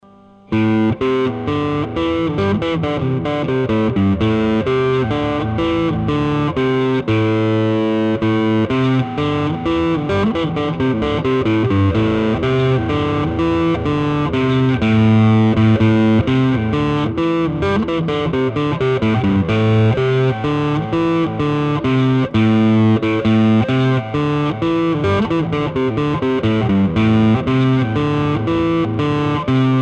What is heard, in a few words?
Guitar; tangled in the strings